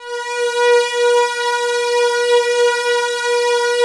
ATMO PADS; Best Service; XX Large - Pads

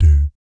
"doot", Jazz Fx, D2; Spectrasonics; Vocal Planet Vol.3 Jazz Fx

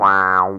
VOX

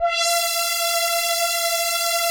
ATMO PADS; Best Service; XX Large

